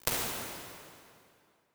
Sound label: Tight Room, impulse responses, medium spaces, rooms; Apple